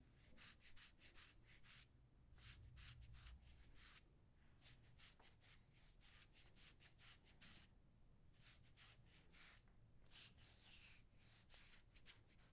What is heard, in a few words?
Scratching